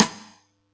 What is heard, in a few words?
Yamaha EX-5